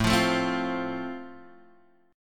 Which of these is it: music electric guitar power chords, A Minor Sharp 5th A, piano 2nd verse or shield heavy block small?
A Minor Sharp 5th A